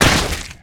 Blood Splat Gib; SFX Kit; Foley - Misc

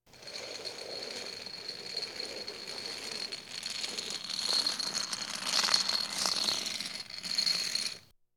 Police, Fire engines

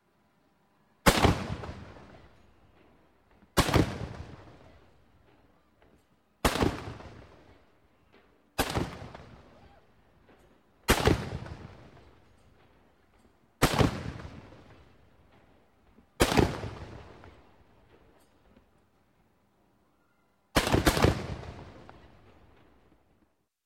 Cannon Explosion; Sound Ideas 2000 Series

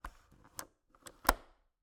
Cabin, window, latch, flip, lock, close, wide; Eiravaein Works; Vaeyan IV

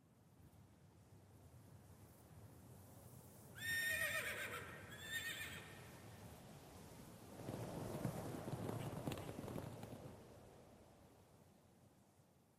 Cowboy Ambi; Pitch Black Audio Pong

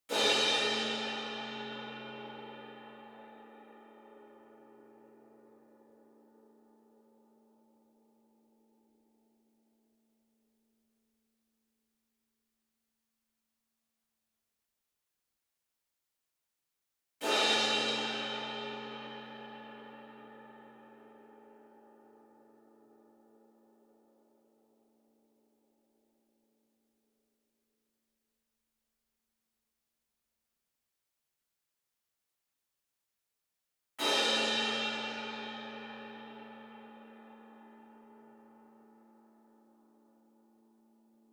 CYMBAL Crash Hit Hard Beater Far; boom library; Cinematic Strikes Construction Kit

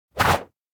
Whoosh Strike Air Whip Like Impact; Hollywood Edge; sound designer's toolkit v2

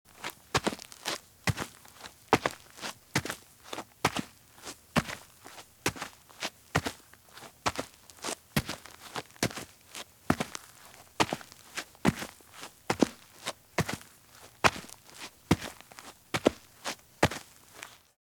Running Shoes Walk at Medium Pace on Dirt; Sound Ideas; Footstep Sound Effects

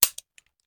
Hand Gun Foley; Sound Ideas; Guns

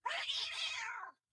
hit; wilkins; Blind Drive

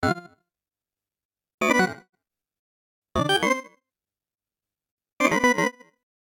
Arcade Click Neutral; boom library; The Interface

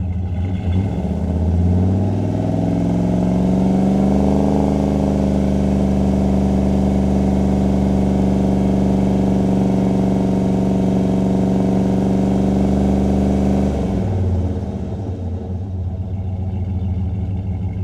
Truck Dodge Ram Fast Idle; Trucks

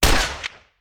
Rifle; Sound Ideas; Guns

